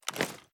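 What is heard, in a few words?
reload empty mag up, shotgun; reloads; iw8; romeo870; gmod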